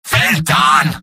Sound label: cheers